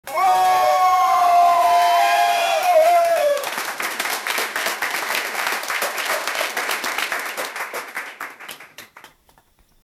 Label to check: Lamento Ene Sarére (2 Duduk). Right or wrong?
Wrong